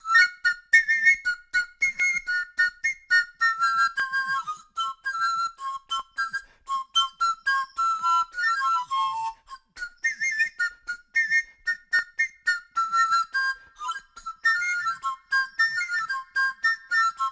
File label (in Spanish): Rondador Solo